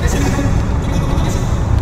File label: glitch pre att; skibidi toilet glitch